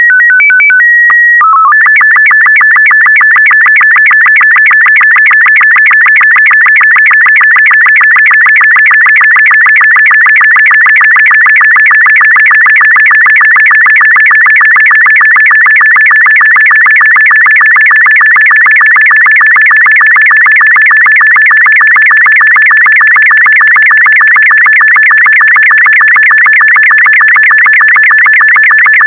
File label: squeaky music; Alien Transmission